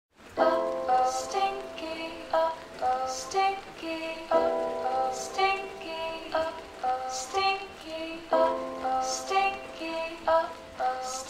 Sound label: soft lofi beat